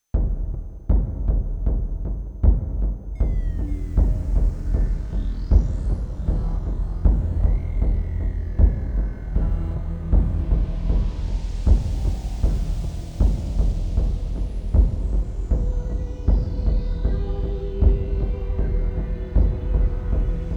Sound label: Instrumental